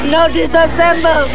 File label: pain; alienarena; johnny5